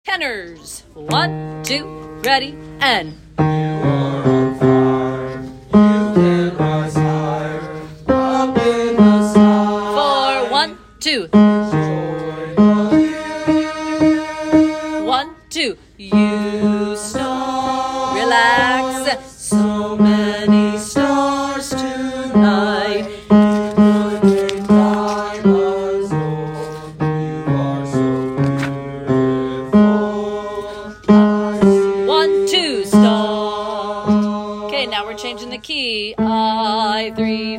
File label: Tenor, vocaltracks; Mean Girls